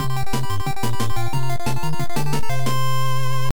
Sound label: Opponent Goal; Captain Tsubasa II Super Striker; NES